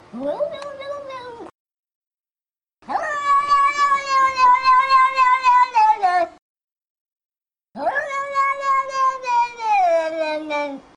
eevviee cat no no no

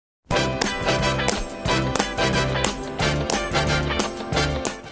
song; carlton

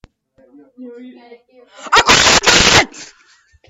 fart

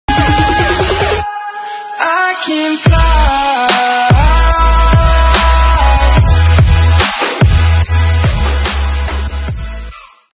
intro bass song

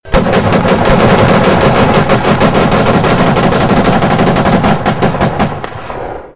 B-17 ball turret firing two; Two turrets

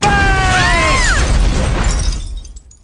big bang

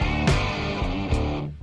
brap

